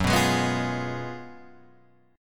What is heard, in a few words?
Gb Major 7th Suspended 2nd Suspended 4th Guitar Open G